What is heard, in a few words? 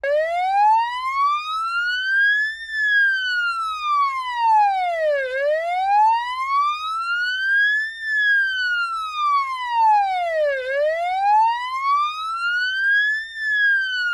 Ambulance Siren Type 1 Type 2 Close; FLYSOUND